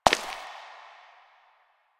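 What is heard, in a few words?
Step Rock, footsteps; Warframe; Koumei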